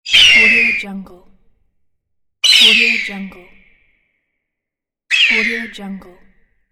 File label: eagle scream